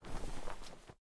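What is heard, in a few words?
Movement Cloth; smite; god bakasura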